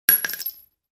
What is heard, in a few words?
Bullets shell casings